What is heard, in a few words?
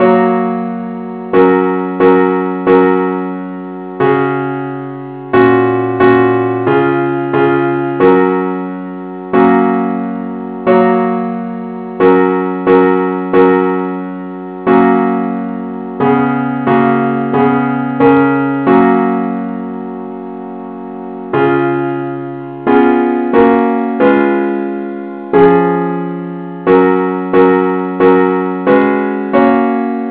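parts only key F sharp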